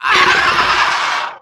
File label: Ravenous Pterrordax Death